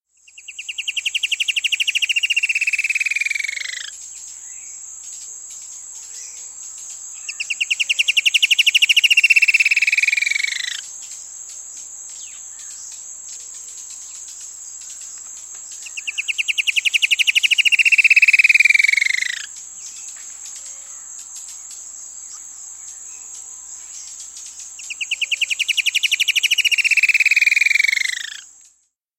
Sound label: Leaden Antwren, S. America